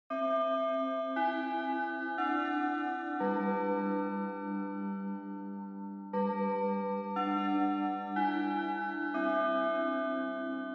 Japanese School Bell Far Slow Mid

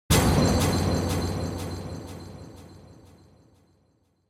Onoma-Impact (Low-Delay)

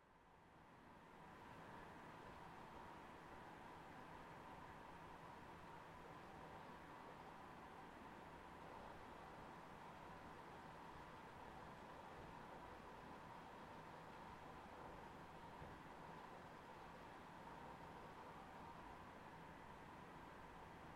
PC Operating Noise Far Long

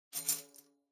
Tambourine Noise

